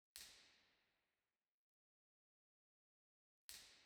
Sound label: Musikverein, Theatres